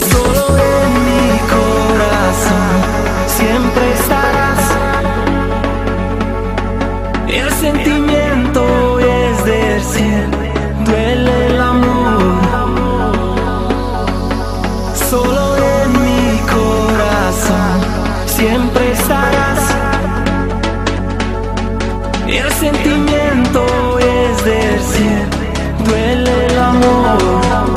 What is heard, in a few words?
spanish ringtone